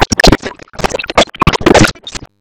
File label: Sentient Vessel Heavy Stomp Rocks; Warframe; Tau; Abilities